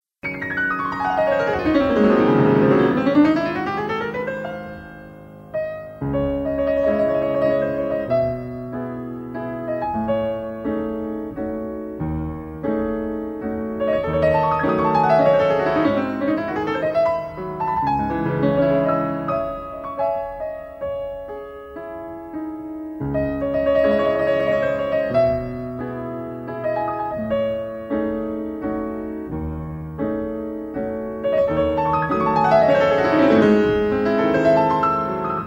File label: Romance C-sharp minor